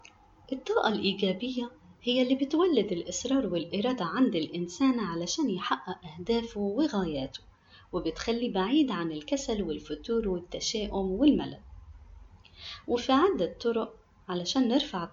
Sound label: Arabic Egypt